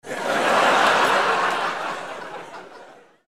laugh

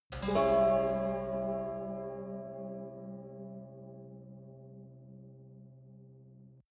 haunted strings chord major

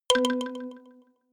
notification kalimba delay octave high

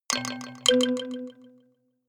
notification kalimba delay octave up

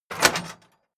chest metal small open

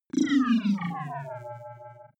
wobble drop long

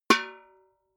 metal bucket hit ring small

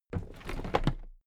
toolbox raise heavy

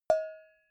cowbell hit soft

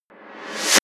reverse hit short wind

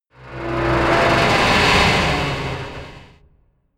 metal metal grind large

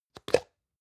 plastic can small lid open medium

